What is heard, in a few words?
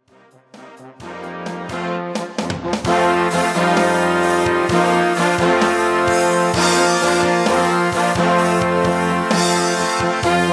brass